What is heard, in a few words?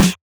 snare; goodtakimu drumkit; fl studio; drumkits